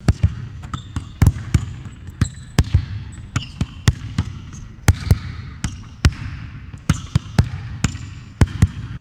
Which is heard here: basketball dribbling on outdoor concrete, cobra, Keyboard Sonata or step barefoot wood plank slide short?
basketball dribbling on outdoor concrete